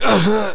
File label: male death; ufoai; soldiers